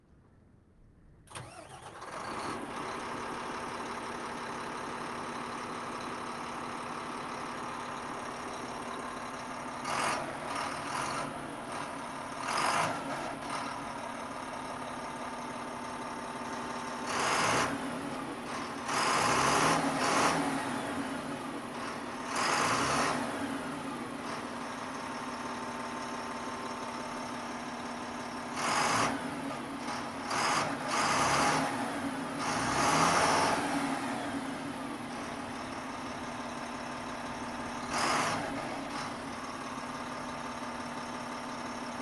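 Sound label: Volvo dump truck exterior startup idle blips steady rpms shutdown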